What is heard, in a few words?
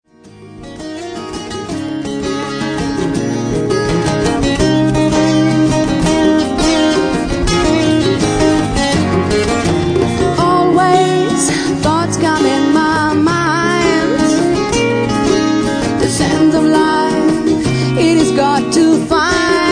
inspiration unplugged